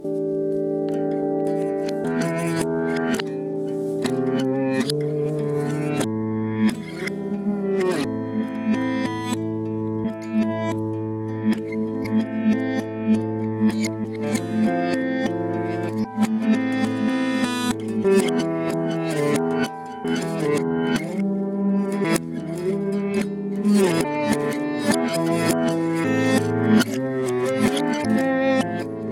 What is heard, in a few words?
Reverse Acoustic Guitar